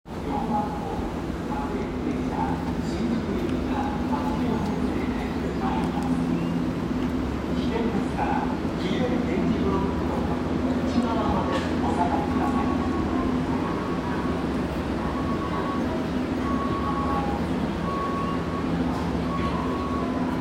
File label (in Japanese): 小田急線代々木上原接近